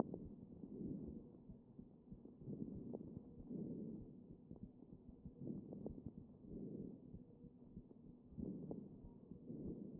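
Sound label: anterior chest, position 4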